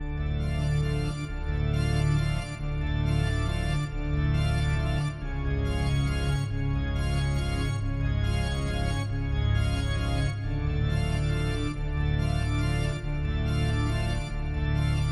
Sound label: waltz